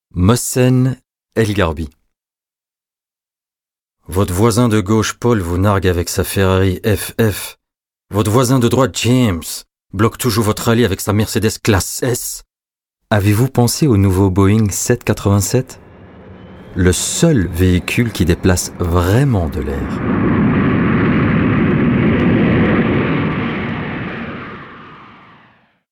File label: Boing; Mohsen El Gharbi